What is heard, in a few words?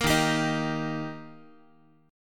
D sharp Major D sharp Guitar, chord; strummed; 13,11,10,13,x,x; JGuitar